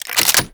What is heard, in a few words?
Reload, Weapons; Warframe; Boar